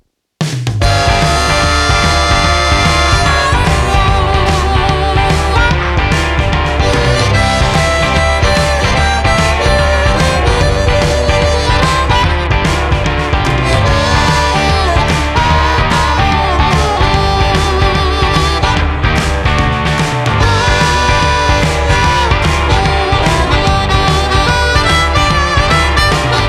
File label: Intro Blues